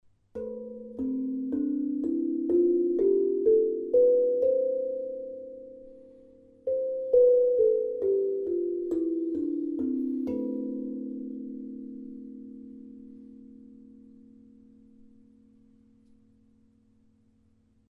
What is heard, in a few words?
Ionian scale mallets